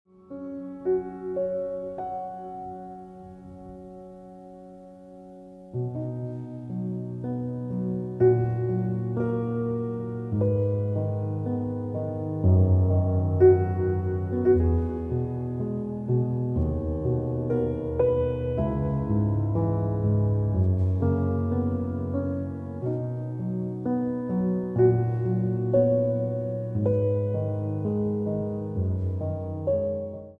Dont Leave Ringtone Piano Music

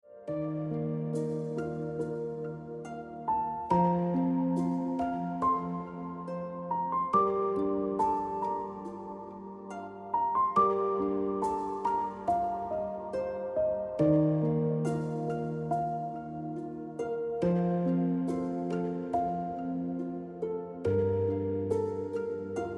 Emotional Life Ringtone Piano Music

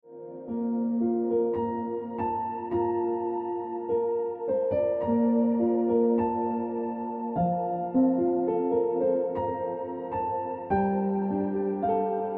Piano Music

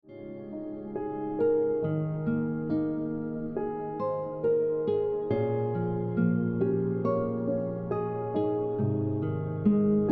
Ringtone Piano Music

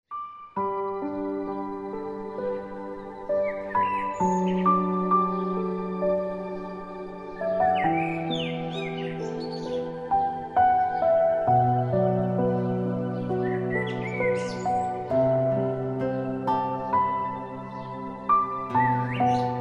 Piano Music

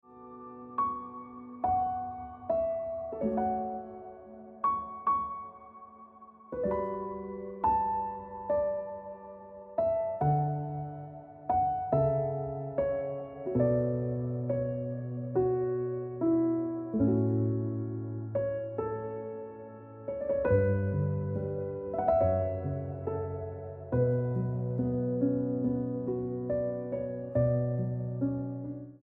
Piano Music

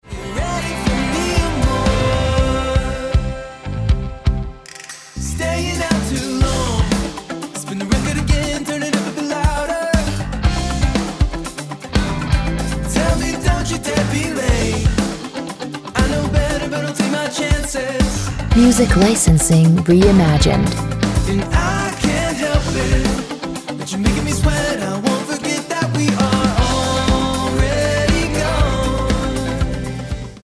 Falling Ringtone

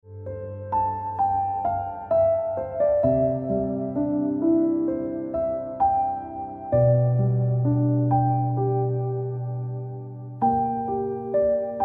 Ringtone Piano Music

